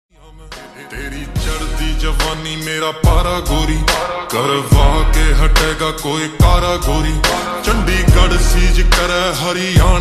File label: Slowed Reverb Ringtone